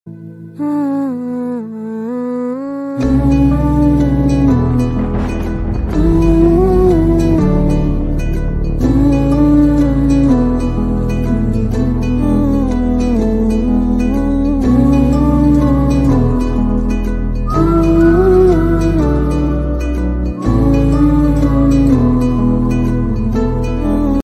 kumbalangi nights bgm

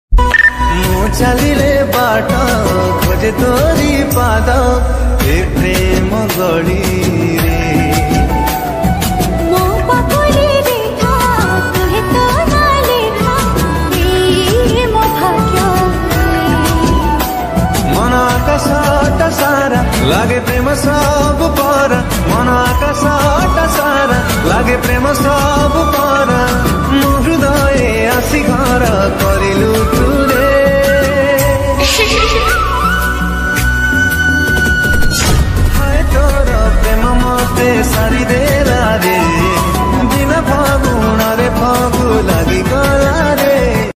odia love ringtone; odia sadsong

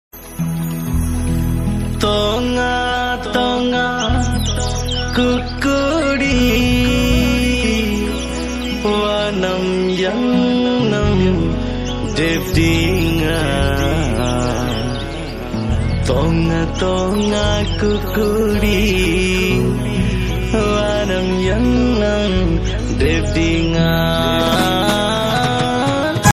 new viral song music english ringtone

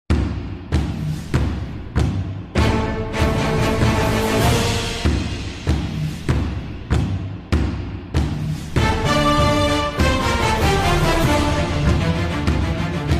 bgm theme; robo; red chip; chitty

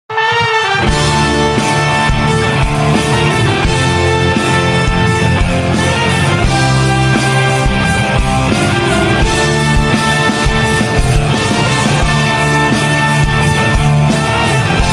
tarararara bgm ringtone

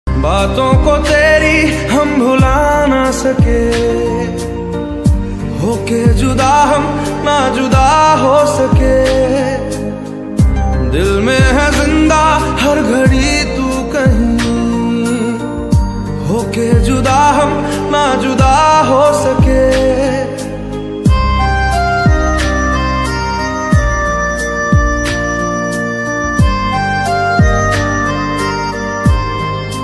sad ringtone love ringtone

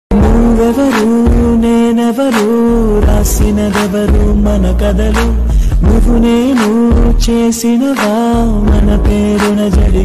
telugu songs sad

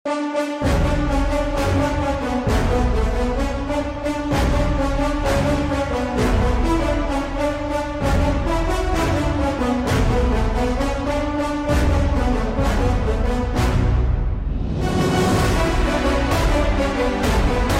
ringtone attitude ringtone; aavesham

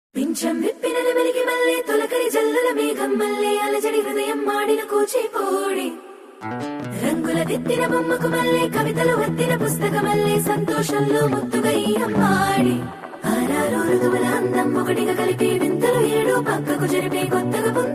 melody

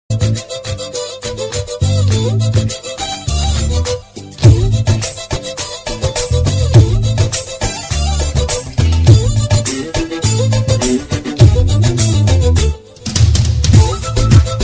song bgm ringtone; santhosh subramaniam

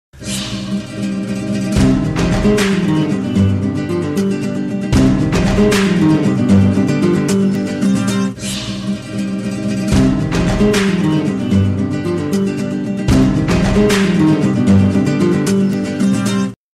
intro bgm

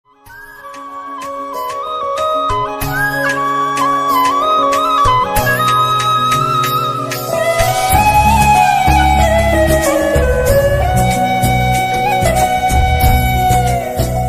Tamil Love Bgm Ringtone

